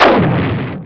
Explosion; worms2

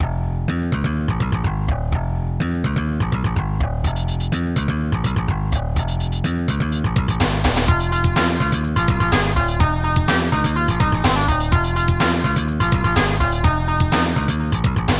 bonusmusic

